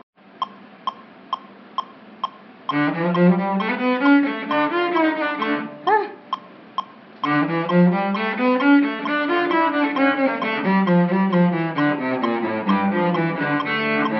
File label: gavotte hurry up